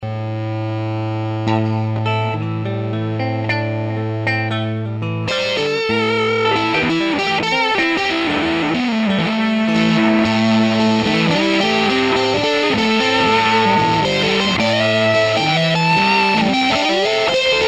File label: p416b GT308B, fuzz; Fuzz Tone List